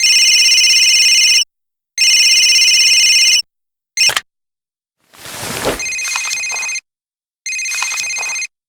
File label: phone calls breakdown